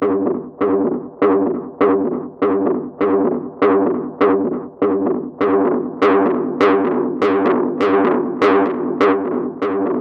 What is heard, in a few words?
Top bass Filter and Distortion